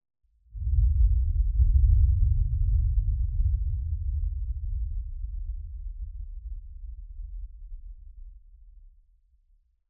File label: Explosion, SUB; 5.1 Surround